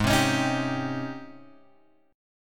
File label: G sharp Major 11th; G#M11 Chord {4 3 X 6 4 3} strummed